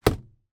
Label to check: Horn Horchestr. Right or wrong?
Wrong